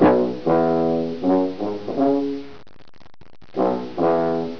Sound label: tuba